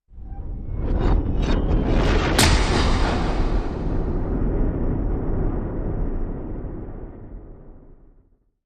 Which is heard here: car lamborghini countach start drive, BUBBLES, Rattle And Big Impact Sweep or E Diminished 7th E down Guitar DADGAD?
Rattle And Big Impact Sweep